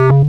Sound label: Synth Sound; Vengeance Samples; VEE Synth Shots